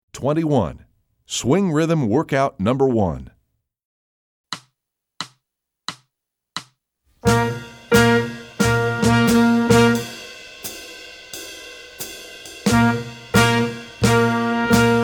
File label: swing rhyhms workout